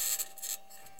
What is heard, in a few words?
mic, mars2020 edlcam